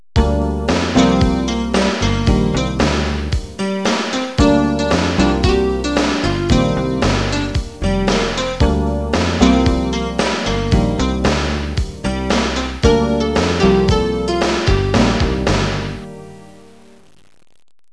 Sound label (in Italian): suonerie businessy